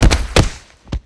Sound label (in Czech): dopad; Mafie 1